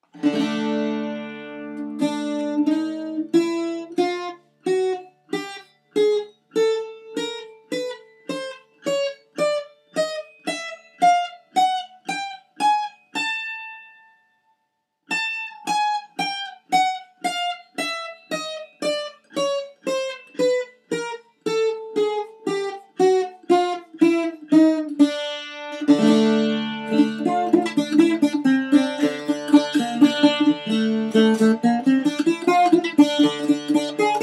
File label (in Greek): mpouzouki zografisto